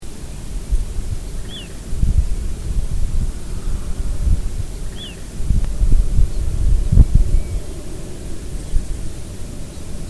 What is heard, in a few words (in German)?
Flussregenpfeifer